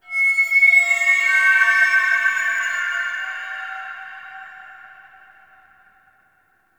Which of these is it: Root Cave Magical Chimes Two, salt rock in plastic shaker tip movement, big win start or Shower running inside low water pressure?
Root Cave Magical Chimes Two